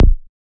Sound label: Ashes Bass C